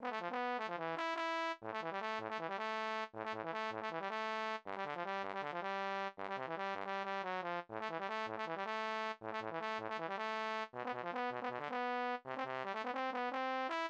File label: Trombone Solo